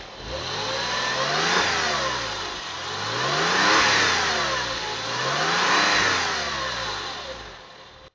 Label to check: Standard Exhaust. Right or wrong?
Right